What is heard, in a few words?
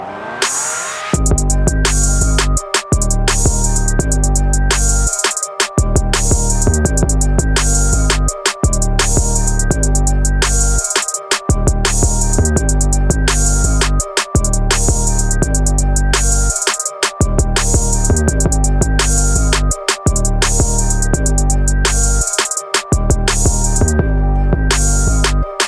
Beat Ringtone